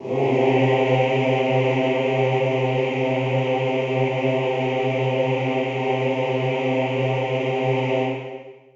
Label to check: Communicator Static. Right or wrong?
Wrong